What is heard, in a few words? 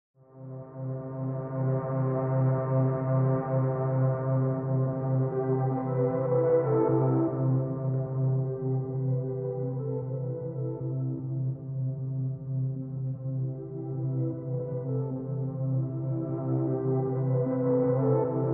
Viola Movement; Matthew Simms